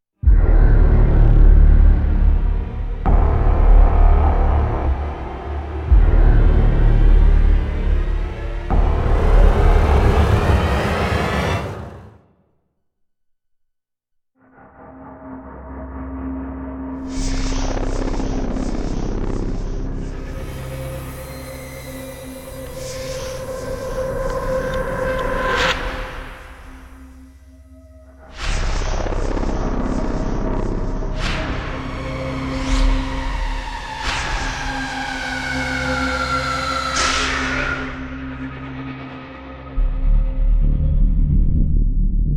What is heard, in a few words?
no percussion